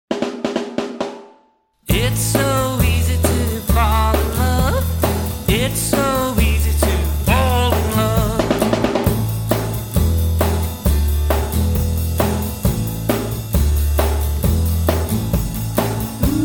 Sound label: A No Lead Guitar, backing tracks